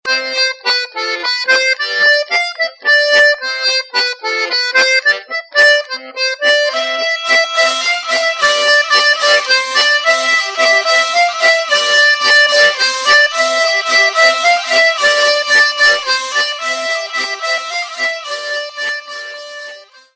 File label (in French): bouree, france, auvergne